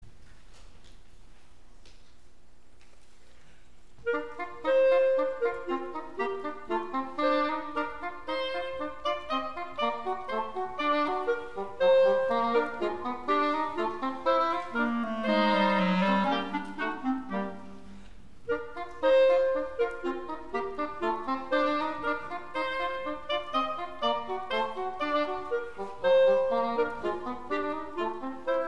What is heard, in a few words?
divertimento for oboe english horn and clarinet; busch; adolf busch